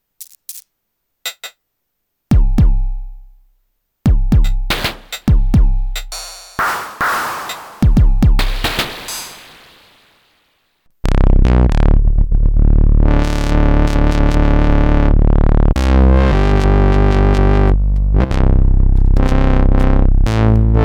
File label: Boomy Booms; Artiphon ORBA